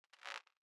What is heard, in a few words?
zap